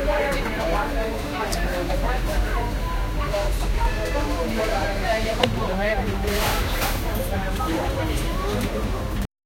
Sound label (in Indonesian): street food sepi, street food